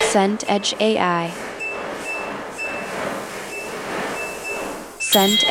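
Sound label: ambience pub interior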